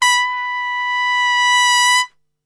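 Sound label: B 2 trumpet; Best Service; Brass Super Section; TRP SWL; 90 s Sample CDs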